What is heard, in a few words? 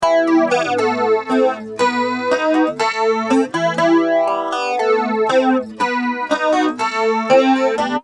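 acoustic guitar flanger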